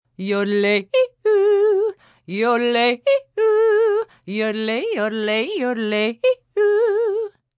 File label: Yodel Sing, Yodelling, female (human voice); Singing Sound Effects; Singing (Sound Effects:Voice); VoiceBox; Twistedtracks